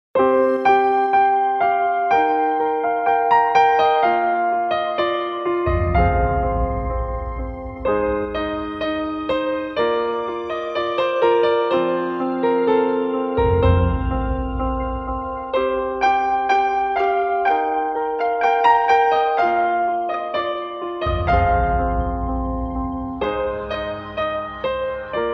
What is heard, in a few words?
Piano, alarms; Elephone U Pro